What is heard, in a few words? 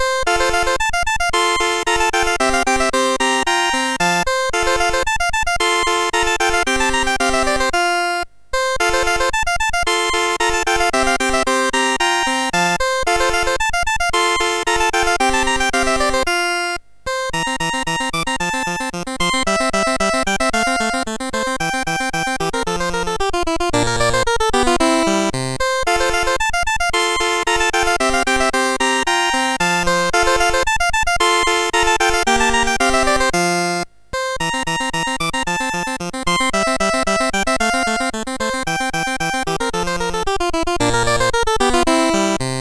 Scherzo Sonaina, MOS6581R4